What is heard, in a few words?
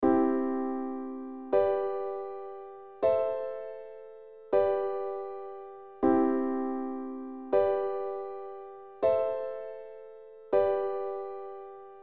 I V VIm V; Les bases harmonie; Progression Accords